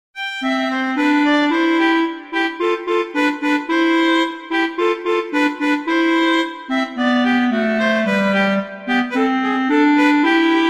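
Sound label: Duet, horn; Mozart; Duets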